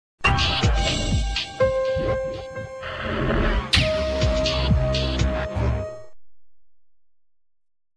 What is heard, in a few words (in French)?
jingle pub; w9